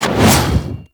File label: Scythe Whoosh; Warframe; Weapons; Stalker Scythe